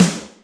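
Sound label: Snare Verbose, Alesis D4 drum machine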